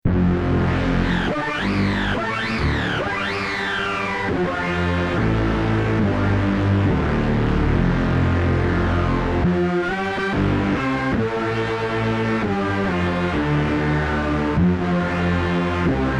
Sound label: Heavy Sound; Music Mouse